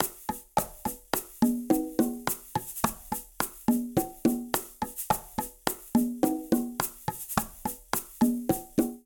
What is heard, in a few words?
Bolero melodico-106bpm, Latin Percussion; Ableton; Factory Packs